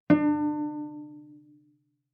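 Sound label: Cello pizz fortissimo sul A D4; MIS Pitches - 2014; Strings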